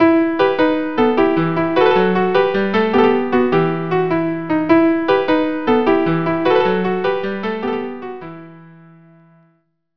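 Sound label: viola viola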